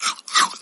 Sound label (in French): morsure